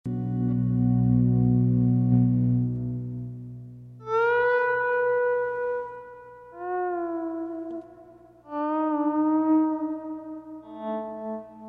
Western sad

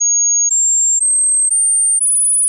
major high

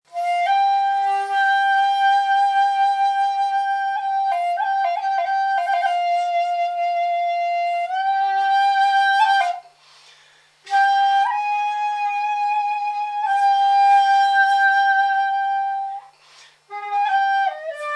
Japanese sad

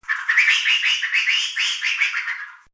upset monkey call

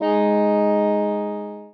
sax fifth

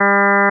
f0 200 full

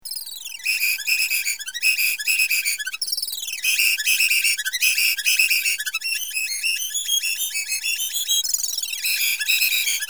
threat-based tamarin music

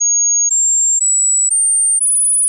minor high

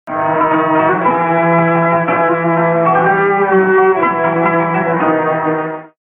Turkish; Turkish 1 Foil 1

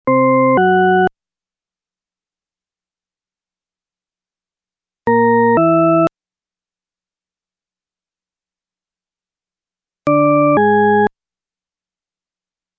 tritone paradox

